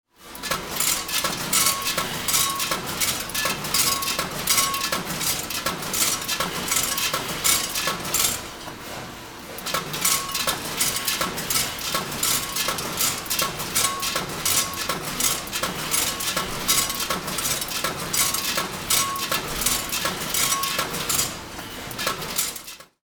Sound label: candies filling machine